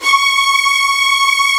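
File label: violins C#5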